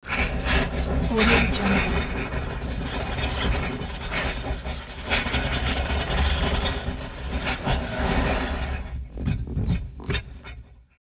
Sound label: evolving metallic morphing tone; hollywoodedge